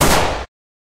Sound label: machine; Zombies Night